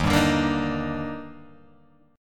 Eb Major 7th, Guitar, Open G

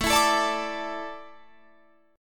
A Suspended 4th, Guitar Drop D

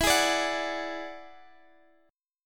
E 9th E down Guitar Standard; strummed; chord; JGuitar

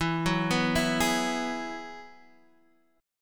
F sharp 7th Suspended 2nd Suspended 4th, E

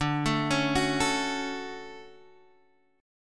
D-Major 7th D down Guitar Half Step Down

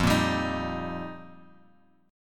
F 9th Suspended 4th, Bass Standard; strummed; JGuitar

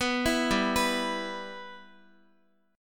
G 6th, Guitar; G6 arpeggiated; x,x,9,9,7,6

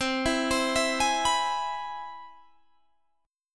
C Augmented Major 7th, Guitar, Standard; arpeggiated; JGuitar

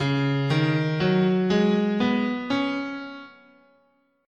C sharp Suspended Pentatonic, scale

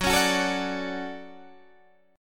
D-Major 7th Gb

